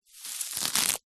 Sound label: grass pick pull rip from ground; zapsplat; foley